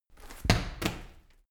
medicine ball wall throw, fitnessstudio

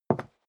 step leather shoes wood parquet single right; schritte

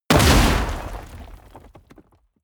explosion dirt metal; explosionen; comedy-cartoon; soundpool

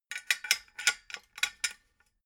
metal scaffold pole splint; industrie-wirtschaft

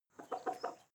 hens clucking short; natur-tiere; huehner

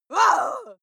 female lunatic scream bark short; schreie; menschen

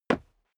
step sneaker concrete single stair up; schritte

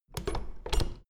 door plastic handle use creak

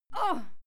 female fight scream pain

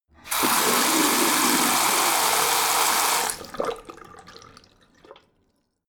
tap flow ultra hard short, badezimmer

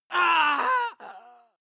male groan deep pain; menschen; schreie

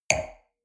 wine glass place table soft short; soundpool

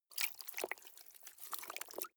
clothes water drip soft, household; haus-garten

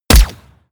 gun shot silencer designed ricochet

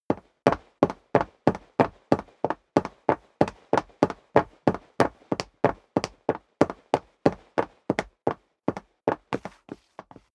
step sneaker wood parquet run sequence; schritte

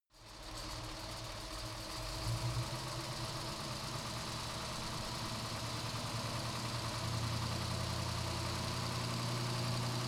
car camaro accelerate decelerate engine

